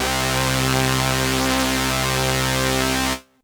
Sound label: Kawai PHm Brass Ens 1 C2